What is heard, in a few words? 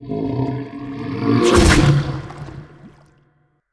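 kraken attack; monster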